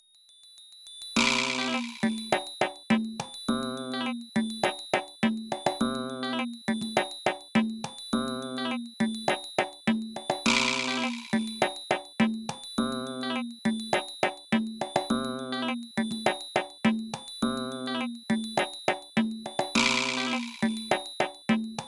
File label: Stung by Bees; doubutsu no mori n64 gamerip 2001